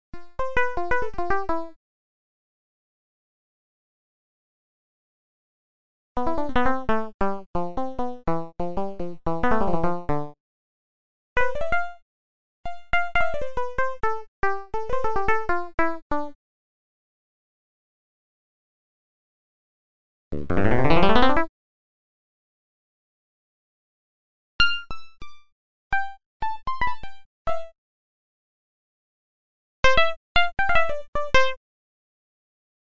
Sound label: Drone Pad Dry